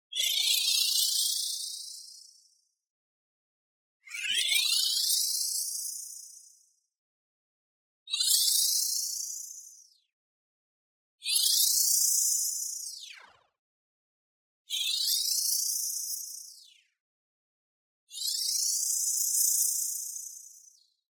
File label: tonal, high movement warp rise; boom library; Anime Essentials; DSGNMisc